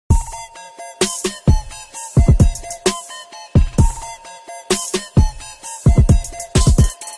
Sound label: Beat